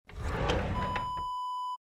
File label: drawer desk open